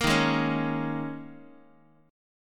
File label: D sharp 7th Suspended 4th D sharp, Guitar; D#7sus4 strummed; JGuitar